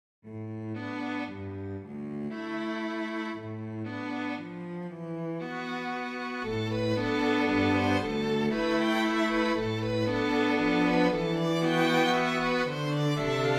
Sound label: String Quartet; Marie Barker Nelson; demo intro